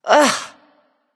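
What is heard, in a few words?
ugh, female; smite; voicepack; hera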